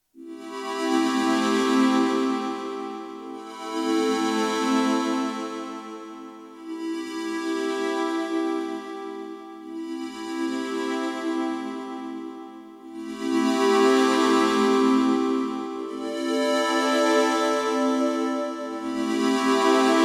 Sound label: Sonicware ELZ_1 8BIT Wavemem Synth Morph Sine Square Saw